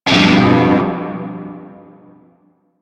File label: Shawzin Maj Chord; Warframe; Narmer Shawzin